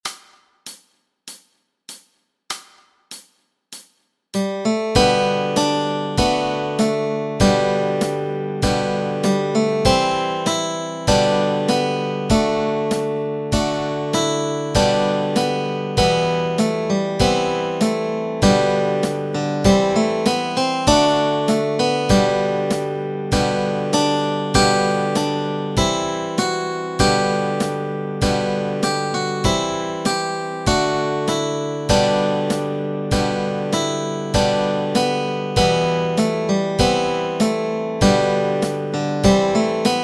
This is My Fathers World melody and chords

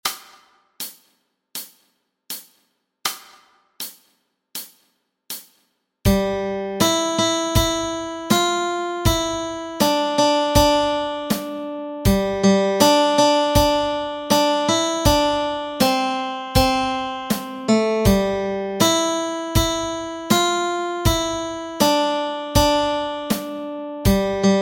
Melody Track

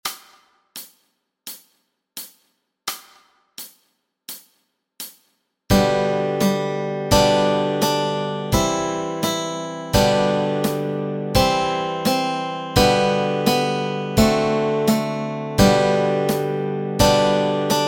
Twinkle Twinkle Little Star Melody and Chords

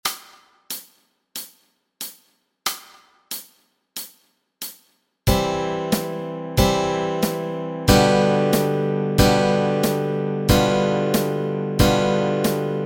chords, Easy Guitar and Ukulele

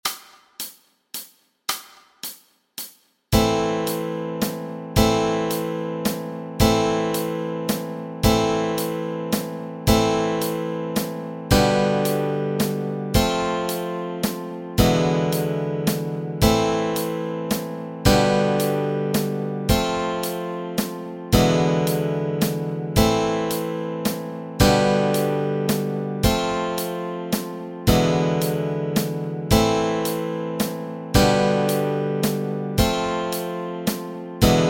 chords, Easy Guitar and Ukulele

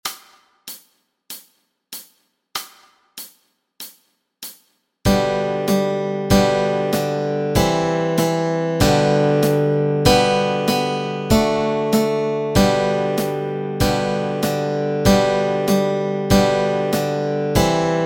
Old MacDonald melody and chords

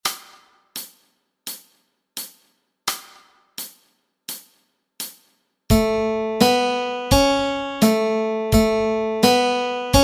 Melody Track

